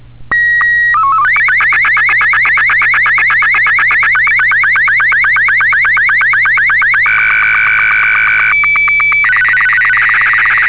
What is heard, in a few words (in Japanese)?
SSTV, 1-96秒; 約９６秒; ｽｺｯﾁ1-96秒